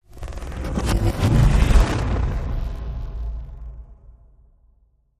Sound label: High Tech Sweep